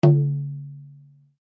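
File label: Drum Flat Skin mezzo forte, Drum heads isolated from drum shell; Musical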